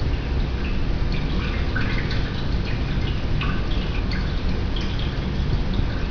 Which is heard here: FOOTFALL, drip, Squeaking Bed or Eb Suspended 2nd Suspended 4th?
drip